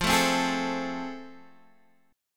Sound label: A Minor Major 7th Sharp 5th, F